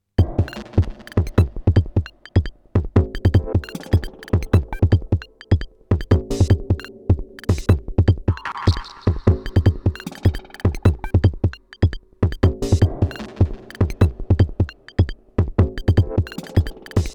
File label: Korg drumlogue